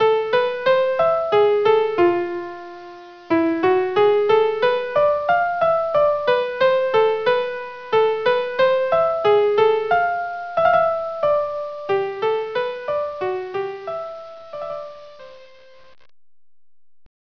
sarabanda

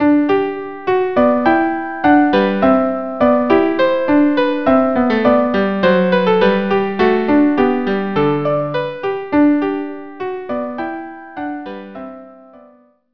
allegro canon; telemann

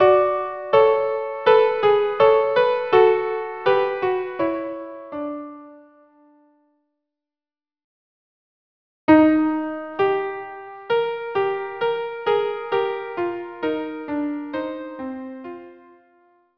Chorales violin viola; Two Bach Chorales

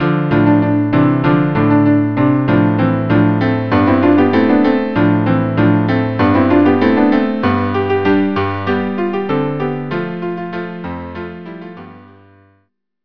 Debka trio

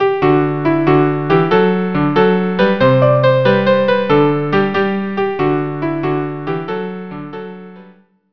row well vn vcl

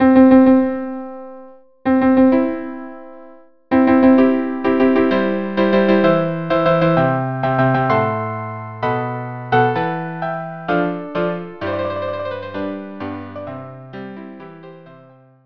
trio

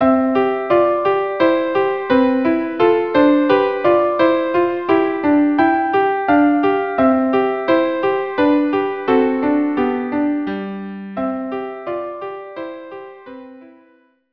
melodie, violin and piano

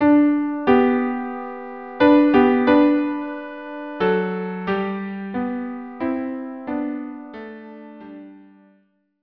violin viola or violin cello duo; Amazing Grace

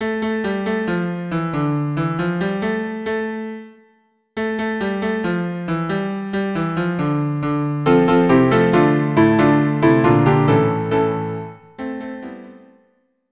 quartet; riu riu chiu

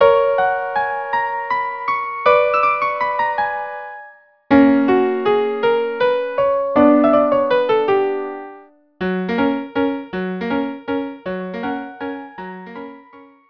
quartet; Seguedille Carmen

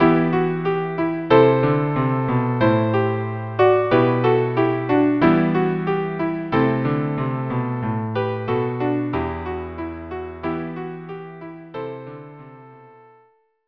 viola

